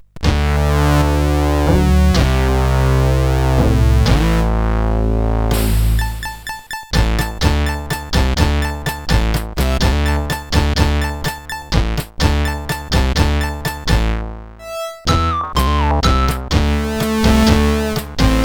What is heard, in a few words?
Crazy Rythm, CSG8580R5